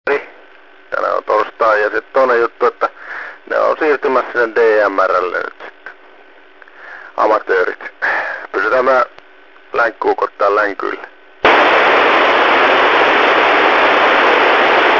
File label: cb